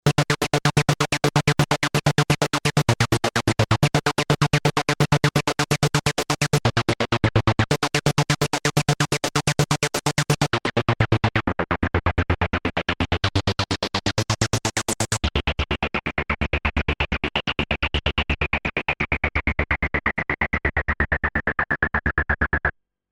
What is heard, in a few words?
Monopoly Hard Sequence